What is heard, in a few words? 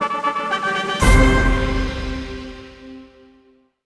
Jingle Victory Fanfare